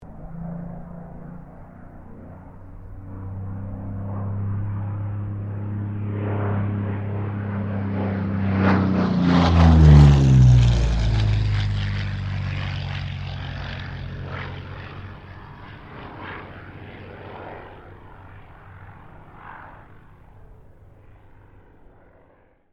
AIRCRAFT P-40 KITTYHAWK PASS STEREO; P-40 Kittyhawk / Warhawk / Tomahawk; Airplanes; Vehicles

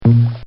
Bang Of Plastic Bottle; Plastic (Sound Effects:Materials)

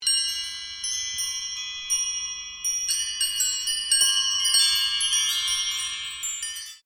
Windchimes Random, Orchestral windchimes

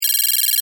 Data Text Typing, Beep, Readout, Scifi; Science, Fiction; Tech Sound Effects; Blast Wave

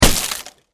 Impact Door Kick Break Wood Heavy; Miscellaneous Doors

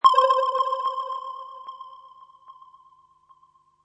Retro Space Echoes: 'Star Trek' style space sonar; Science Fiction Sound Effects

